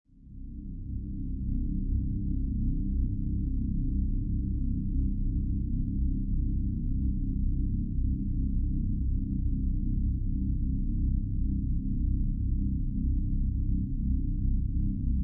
Space Drone, Dark space or onboard spaceship background no; Seamlessly looping; Lynne Music